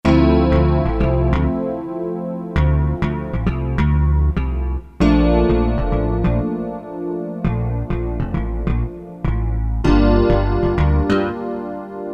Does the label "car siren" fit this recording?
No